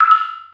drop, waterdrops; tortuga

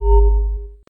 beep repair

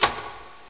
popup; tortuga

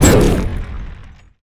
shield hit

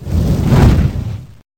shot fireball; shot fireball2

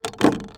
click tray; tortuga

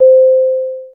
beep slow

